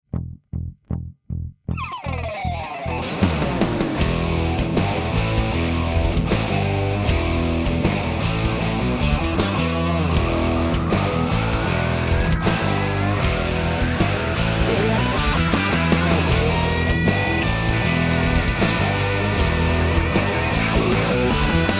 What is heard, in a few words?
Guitars; Zaloop